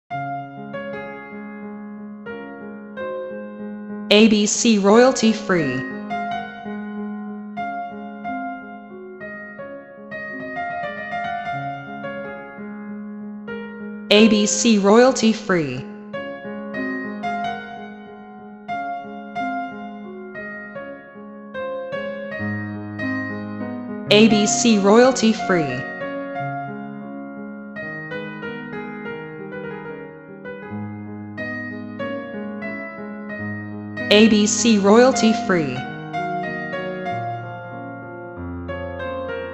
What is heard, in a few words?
Chopin Raindrop Prelude